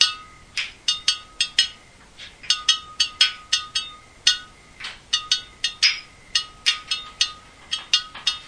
noisy clinking atmosphere, 113 bpm

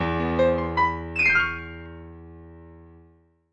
piano dissonant finale; KEYS ORGAN PIANO

